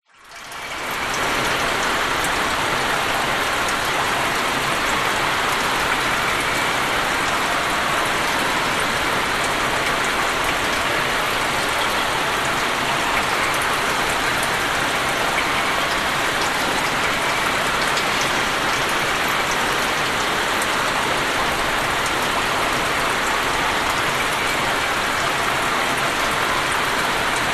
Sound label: industrial warehouse abandoned rain metal roof leaks drips gutter